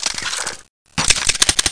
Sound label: desert eagle reload; Soldat